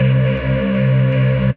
Holy Bass; Synth

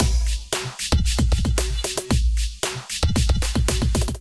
Kit groove 114 bpm; MIDI Clips; Powered Kit groove; Ableton; Factory Packs; Drum Essentials

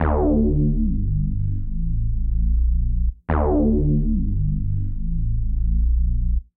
Bass G Minor 146 bpm; MIDI Clips; Ableton; Skitter and Step; The Fear

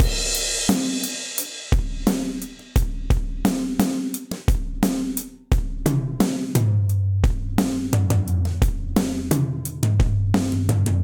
Fill Multirod Dry 97bpm, drums; Fill2; Fort Knox; Ableton; Factory Packs; Session Drums Studio

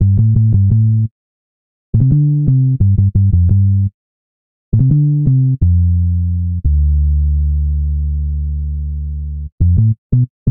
OC Bass A Minor 86bpm, Bassline; Tonal; MIDI Clips; Drive and Glow; Ableton; Factory Packs

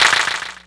Clapbox Pitch; Ableton Foundation FreePacks

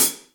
Hihat Pedal Ambient Loud Punch; Drum Hits; Standard Hits; Ableton; Factory Packs; Session Drums Studio

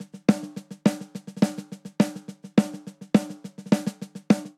Country Snare Only 209 bpm; 4 4; MIDI Clips; Ableton; Factory Packs; Drum Booth

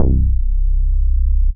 Big Bumble Bass, Synth Bass; Ableton; Factory Packs; Beat Tools

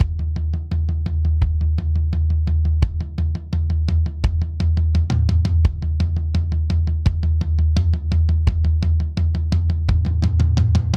Toms Pop Buildup 85 bpm; 4 4; MIDI Clips; Ableton; Factory Packs; Drum Booth

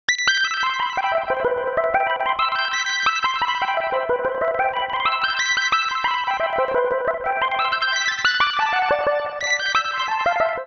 Smooth Lead E Minor 90 bpm; MIDI Clips; Tonal; Melodic; Smooth It Out; Ableton; Factory Packs; Chop and Swing

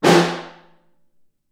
Snare Far; Ableton Foundation FreePacks; Snare Designer

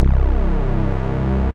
Watch That Bass, Operator; Instruments; Ableton; Factory Packs; Synth Essentials

